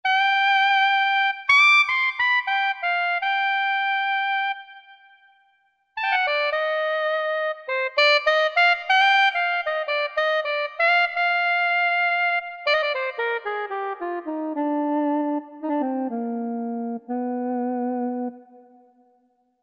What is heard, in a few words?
Soprano, Korg MSC-11S Brass